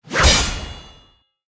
sword impact

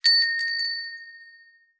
doorbell

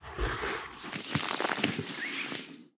tape measure

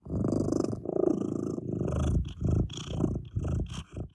cat grunting

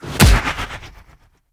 punch

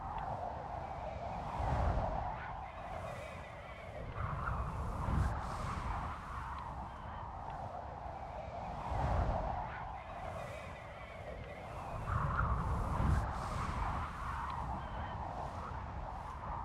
snowstorm

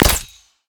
p atk nightarrow

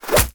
arrow target

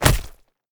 punch

